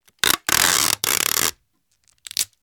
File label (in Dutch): verpakkings tape afrollen